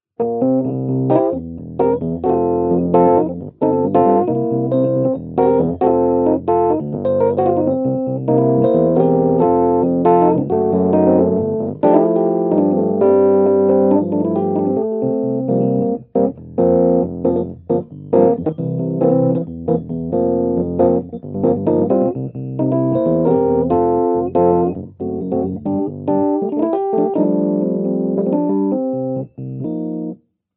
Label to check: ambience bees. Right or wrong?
Wrong